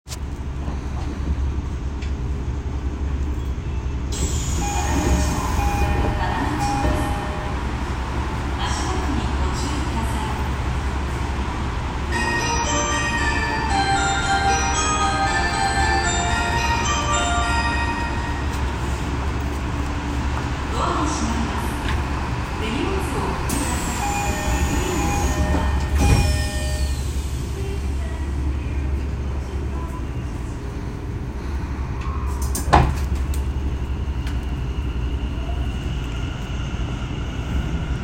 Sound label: train sounds; 有楽町線要町東